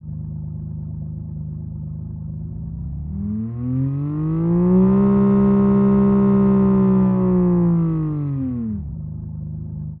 i6 german sport ext, engine sound

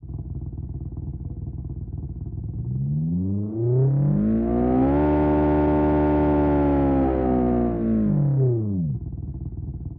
boxer japanese ext, engine sound

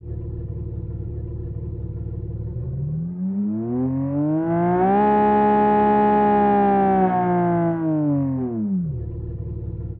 boxer german ext, engine sound

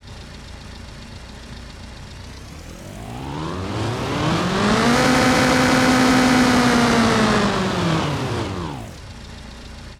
city, engine sound

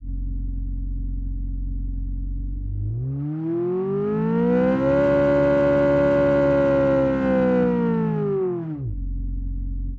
v8 italian, engine sound

